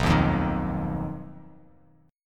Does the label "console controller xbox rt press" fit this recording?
No